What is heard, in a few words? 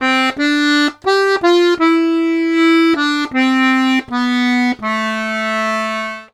Accordions; USB Soundscan vol.40; AKAI